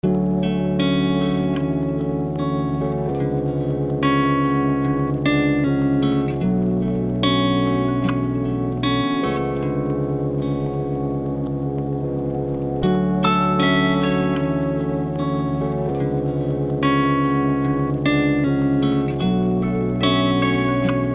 Lofi Melody Loop Fmin 75 BPM

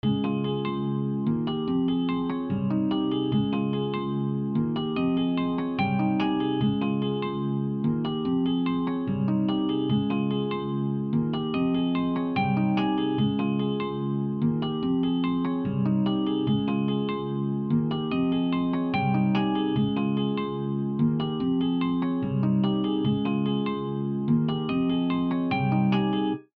G maj 146 BPM

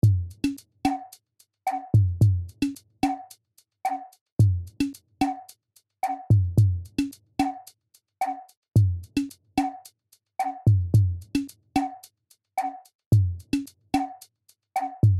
Afro Melody Loop Dmin 110 BPM Percs

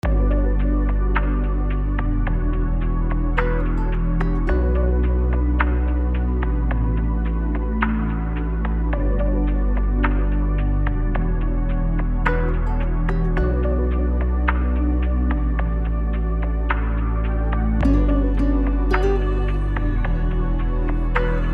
RB Melody Loop Bmin 108 BPM

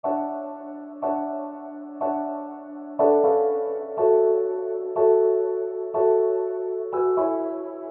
RnB Melody Loop Fmin 122 BPM Piano

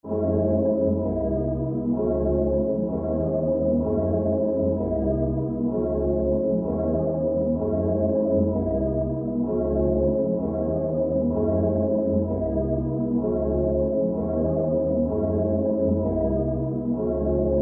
Pad Loop Cmin 128 BPM

